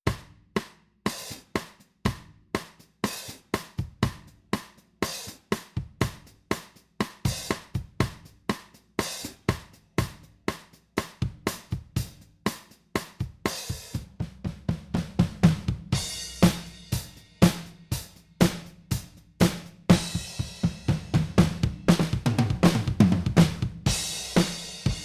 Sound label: pop drums